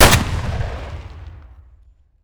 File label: mr153 fire close; Escape from Tarkov